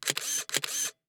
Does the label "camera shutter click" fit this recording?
Yes